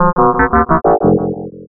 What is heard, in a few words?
alien signal